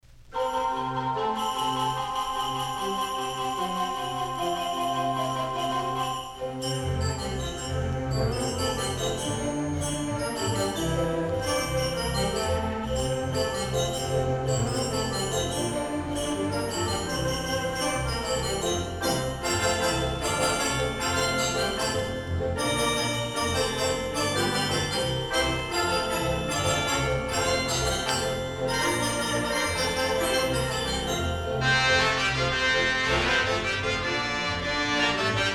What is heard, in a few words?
Jingle Bells